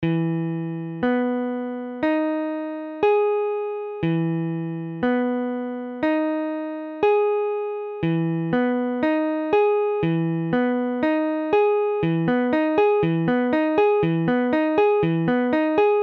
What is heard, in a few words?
VII E7M; guitare